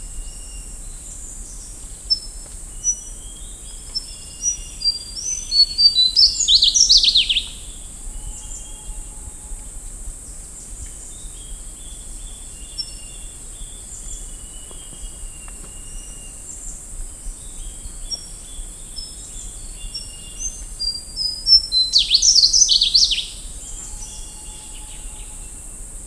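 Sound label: Slaty bellied tesia, bird; تسک شکم توسی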